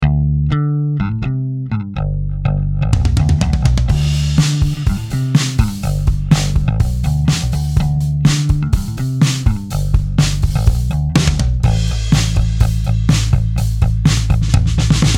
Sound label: fliptop, bass amp designer; logic pro